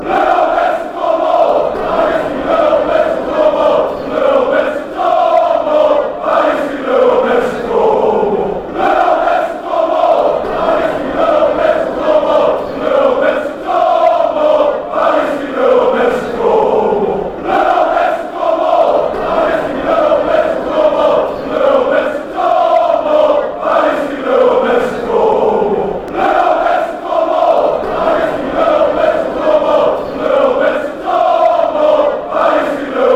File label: drum